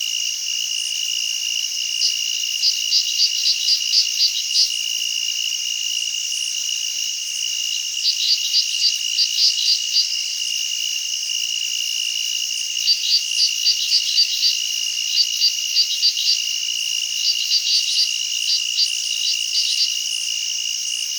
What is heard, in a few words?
jingle; jingle amaznia